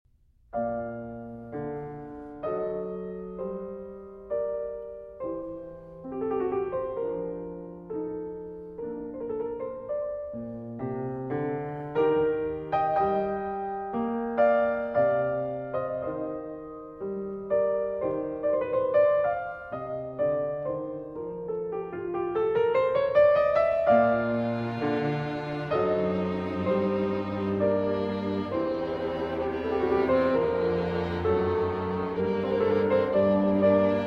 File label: Piano Quartet in G Minor Andante